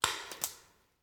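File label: Paintball Gun Impact; Sound Ideas; Guns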